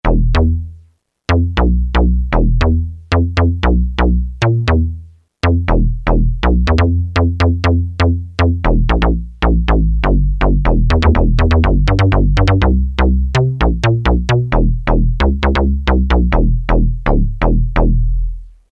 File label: Metalrezo Bs; Roland M-DC1